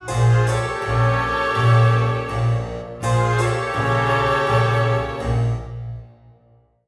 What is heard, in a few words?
Play Stinger